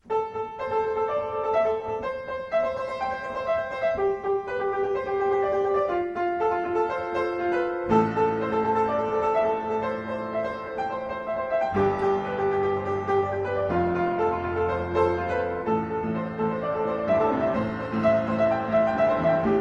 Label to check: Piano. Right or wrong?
Right